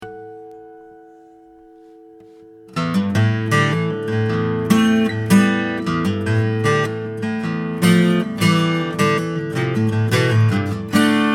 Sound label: disruption instrumental